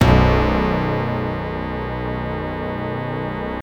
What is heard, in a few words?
Gong; Fatal Fury; Garou Densetsu 2; genesis